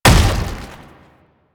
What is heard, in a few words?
Step, Foley; Enemies; Warframe; Proto Infested; Babau